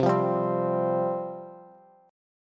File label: C Suspended 4th C, Bass; Csus4 strummed; 20,20,17,17; JGuitar